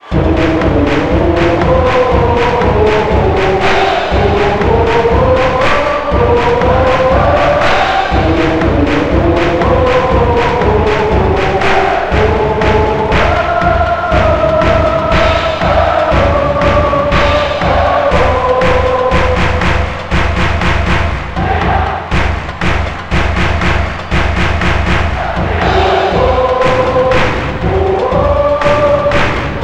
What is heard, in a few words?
Chants, World Cup Type